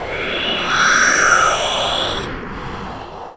NOISE

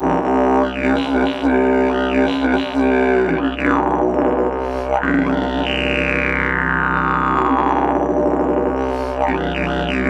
C LONG DRONE, Didgeridoo; Big Fish Audio - Didgeridoo and Other Primitive Instruments

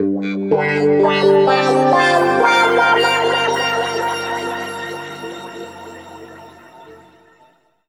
G P-S WA2-L, Guitar; East West; Steve Stevens Guitar Samples Collection; PTCH-SHFT+WA

